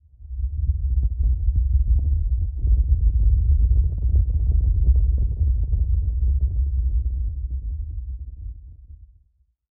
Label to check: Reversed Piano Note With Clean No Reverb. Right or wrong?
Wrong